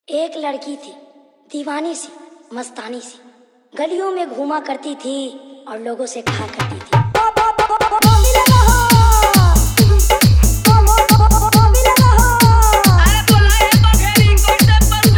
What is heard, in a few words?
Hard Bass Mix